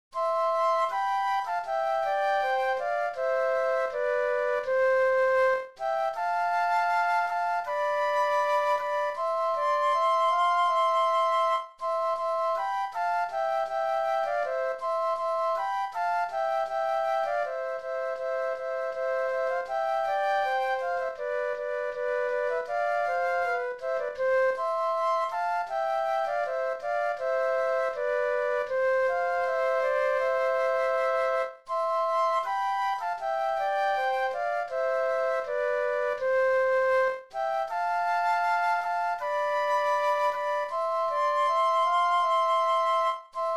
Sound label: Flute Duet; christmas